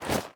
equip leather, armor; Minecraft

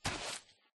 walk, turtle; mob; Minecraft

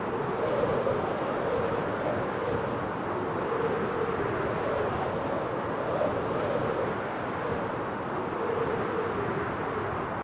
elytra loop; Minecraft

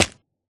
jump egg, turtle; mob; Minecraft

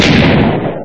old explode; Minecraft

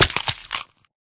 egg break, turtle egg; mob; Minecraft

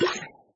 bottle empty; Minecraft

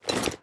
equip iron, armor; Minecraft; neoLegacy

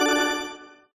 checkpoint; Minecraft

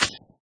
break, leashknot; Minecraft

